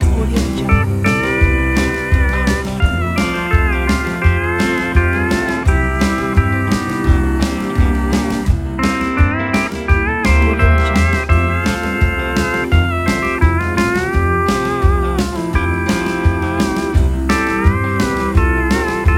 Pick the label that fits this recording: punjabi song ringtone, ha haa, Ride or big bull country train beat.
big bull country train beat